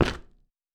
Cement

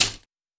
Glass

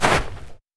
Snow

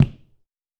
Sneaker

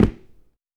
Dress shoe

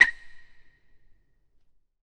Basketball Court

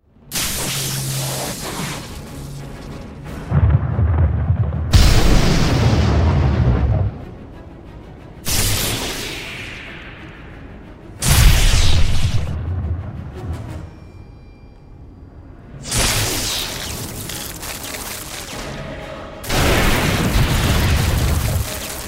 Magic Spell Lightning; Magic Spell Master

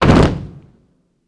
Soldiers

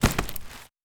Forest

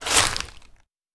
Leaves

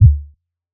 Sub Impact

